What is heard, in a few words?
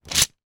game card pick up from playing board; monopoly